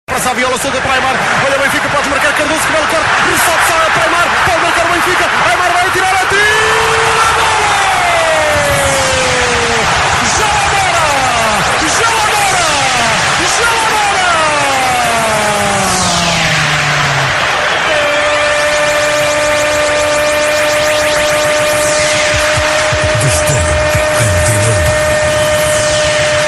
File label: Goal; Benfica